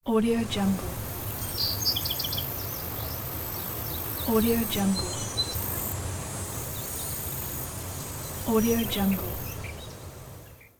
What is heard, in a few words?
forest